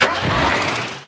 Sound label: Old Pickup Leaving Faster; Farm Mania